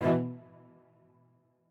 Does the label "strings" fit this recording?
Yes